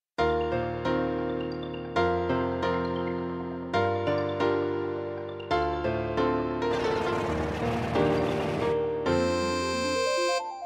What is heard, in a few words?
taiko